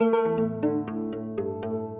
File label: chord A